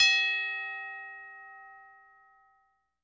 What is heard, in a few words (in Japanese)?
カーン鐘